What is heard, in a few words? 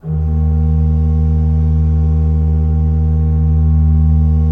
RFRP D1, Cathedral Organ, ROHRFLOETE; Propeller Island